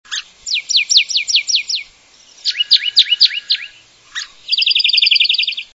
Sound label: Canyon Towhee